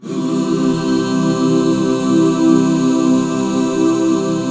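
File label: C major; Best Service Gigapack 1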